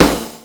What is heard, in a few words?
Snare live, drum hits; Zero-G; Soundclash